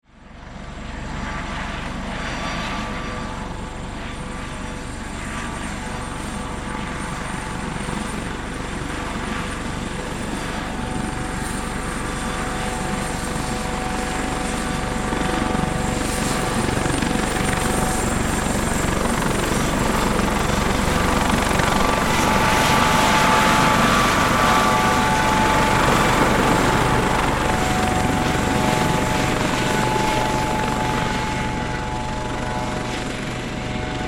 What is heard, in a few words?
Helicopter Take Off, Aircraft, Helicopter, Take, Off, Hh-65, Dolphin; Transportation; Helicopter Sound Effects